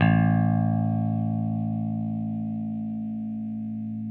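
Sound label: 6 string bass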